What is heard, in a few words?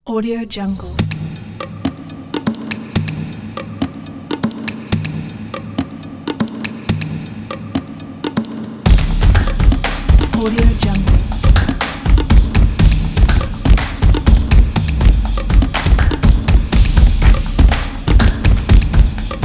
percussion